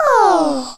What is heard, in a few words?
VOCAL CUTE Call Affection; GameBurp; 2000 Game SFX